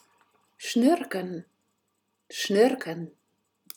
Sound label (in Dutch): snurken